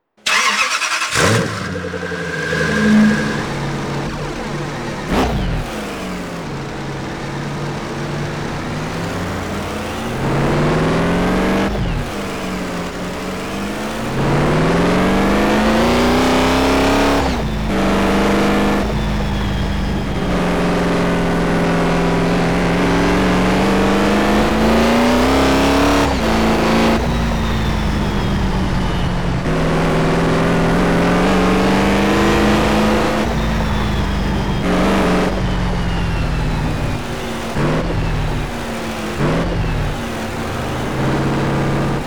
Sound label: koenigsegg agera; 2011